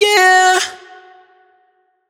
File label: riff Yeah Tuned Ebm wet, Vocal; Slate Digital Omnivox Vocal Sample Pack